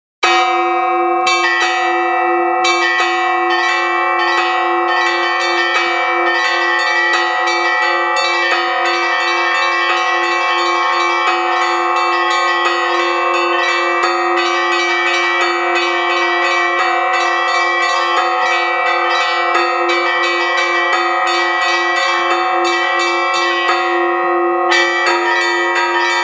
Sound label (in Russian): zvon